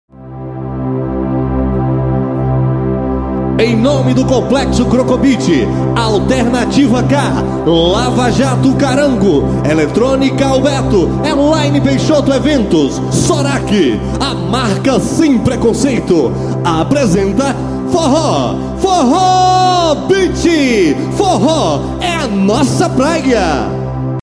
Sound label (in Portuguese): abertura